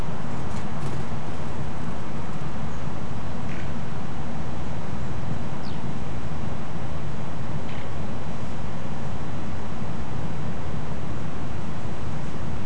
unidentified three calls starling; nocturnal flight call project in beijing autumn 2021

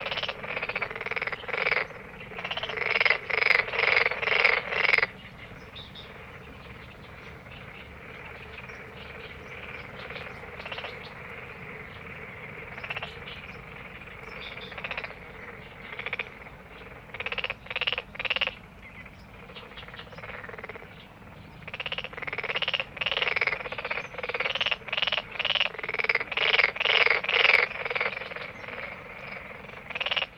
Dark spotted Frog; amphibians of beijing

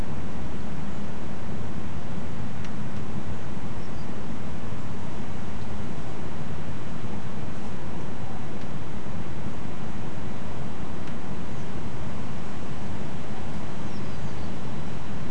downward calls, nocturnal flight call; nocturnal flight call project in beijing autumn 2021

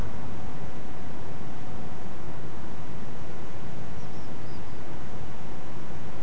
unid 4 note downward call, nocturnal flight call; nocturnal flight call project in beijing autumn 2021

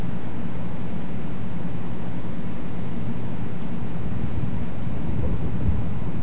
sharp upward 4.5-6.5kHz, nocturnal flight call; nocturnal flight call project in beijing autumn 2021

